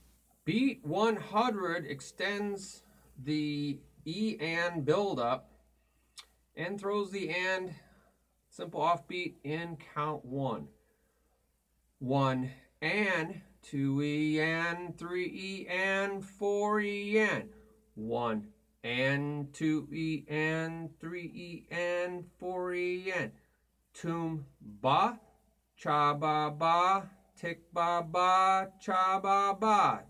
Beat Toom Ba Cha Ba Ba Ti Ba Ba Cha Ba Ba

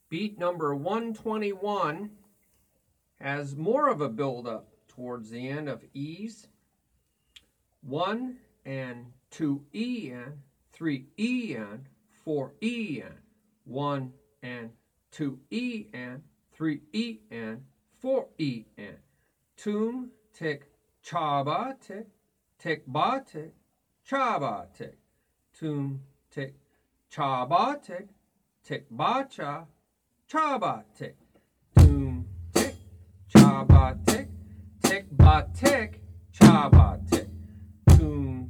Beat 1 2e 3e 4e Toom Ti ChaBaTi TiBaTi ChaBaTi

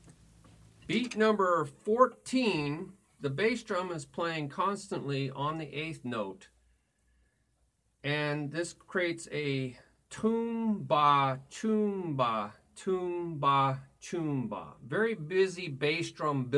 Beat 1 2 3 4 Toom Ba Choom Ba Toom Ba Choom Ba

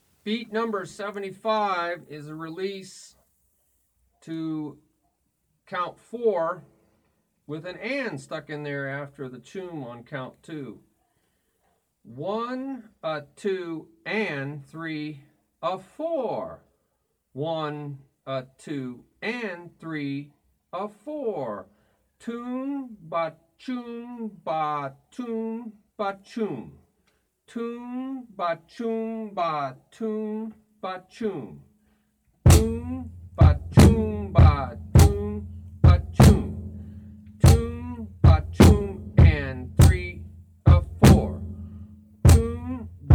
Beat 1 a2 3 a4 Toom BaChoom Ba Toom BaChoom

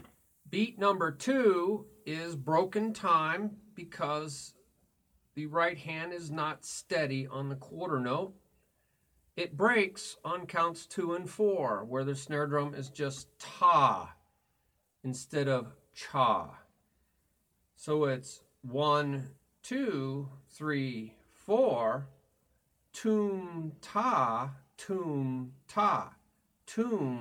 Beat 1 2 3 4 Toom Ta Toom Ta

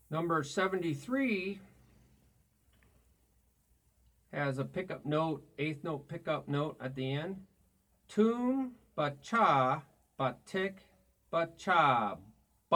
Beat 1 a2 a3 a4 Toom BaCha BaTi BaCha

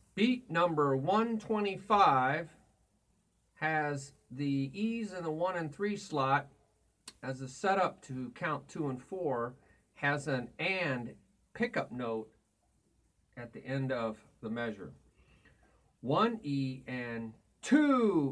Beat Toom Ba Ti Cha Ti Toom Ba Ti Cha Toom

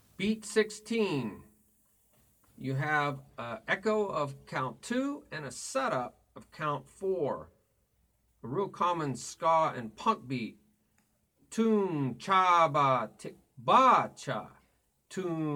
Beat 1 2 3 4 Toom Cha Ba Ti Ba Cha

